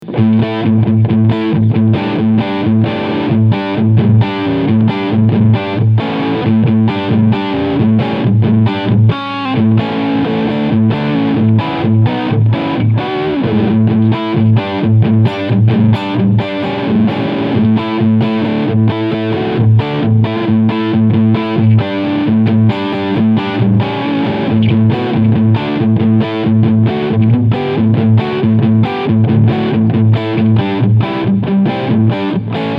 Mooer GTRS Guitars Standard 800 Analog Overdrive Humbucker Middle SC